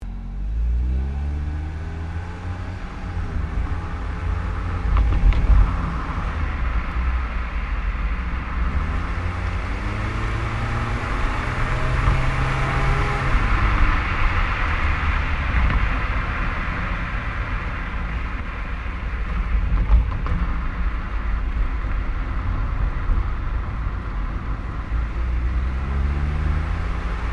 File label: Drive Sequence Exhaust, car; Hyundai Accent 2008; Hyundai hire car; Vehicles